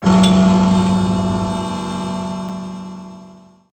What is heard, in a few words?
bell; ragemap2020